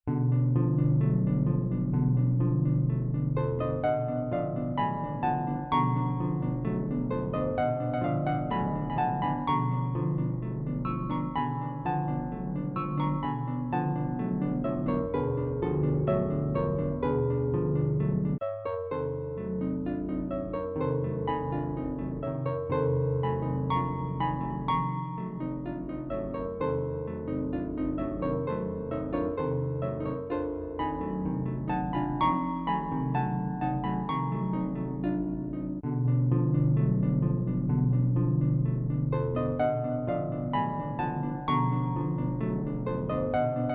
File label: Arpa